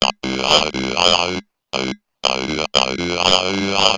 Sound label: Moog Bass Loop